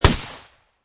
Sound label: Shot Gun Remington 870 12 Gauge Single Shot Designed; Lynne Music